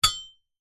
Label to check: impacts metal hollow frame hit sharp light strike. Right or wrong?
Right